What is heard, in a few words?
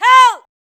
rally beep close